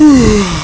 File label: UAAHH!; psion cd vol1